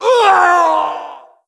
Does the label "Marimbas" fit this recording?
No